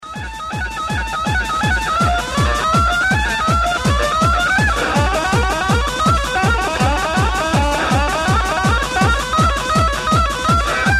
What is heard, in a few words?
Techno Stomp